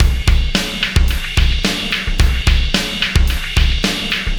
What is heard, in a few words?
Oscillation Beat